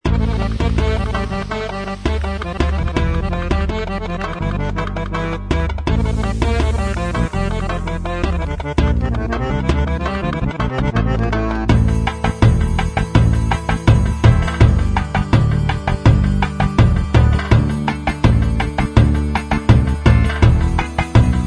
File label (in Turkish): style talava, gem genesys pro oriental